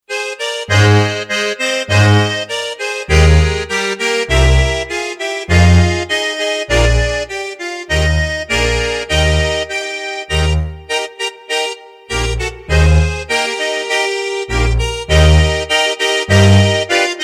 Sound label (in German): Ein kleiner Akkordeon